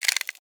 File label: sound clear; yule